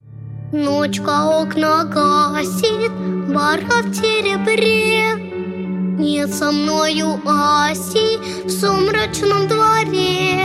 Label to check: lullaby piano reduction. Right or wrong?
Right